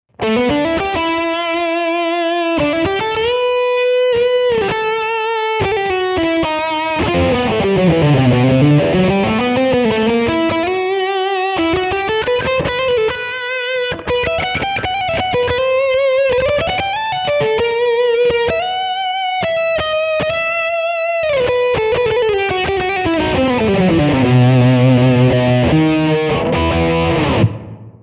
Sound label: ibanez lead